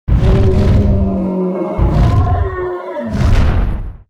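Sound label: Ancient Dragon death, monster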